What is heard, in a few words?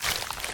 vine pulse; smite; god artemis